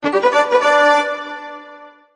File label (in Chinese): 恭喜完成